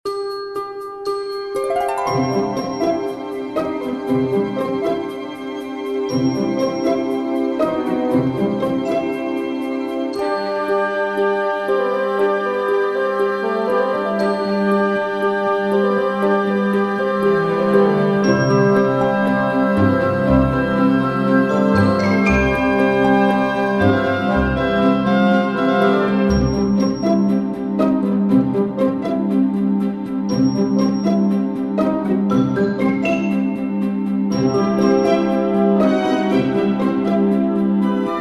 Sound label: Menu music; Farm Frenzy